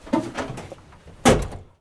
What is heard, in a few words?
Vehicle Hatches; Red Orchestra 41-45